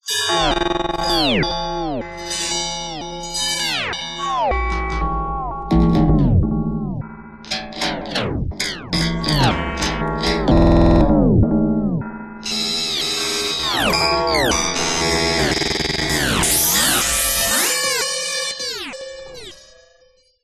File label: bowl graze; Gregor Quendel